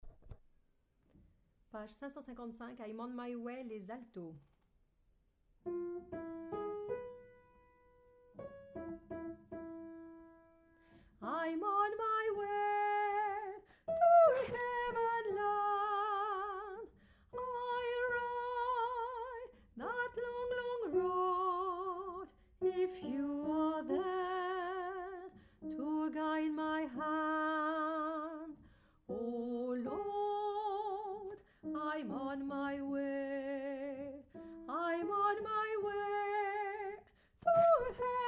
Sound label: Alto